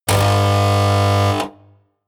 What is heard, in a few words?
buzz